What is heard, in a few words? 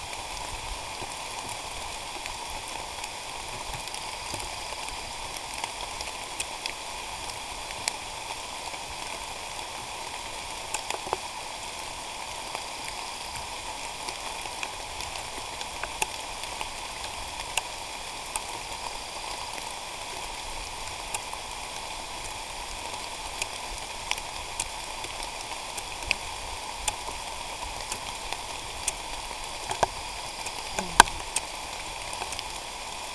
Rain in forest Gabon